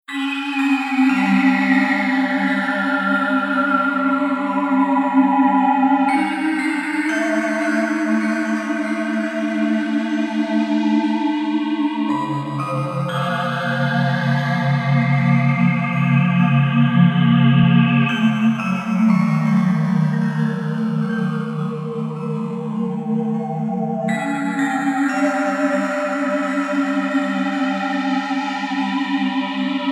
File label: phantom forest, only melody